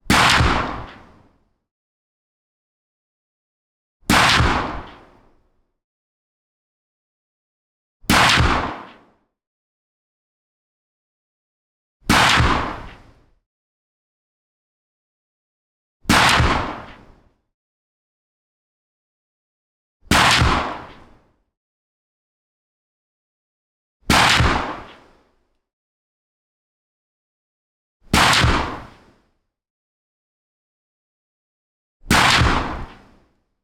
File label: Remington 700 7.62x51mm behind Single shots; 50m; Schoeps